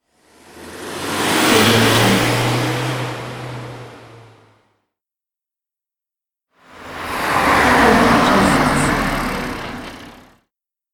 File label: car passing by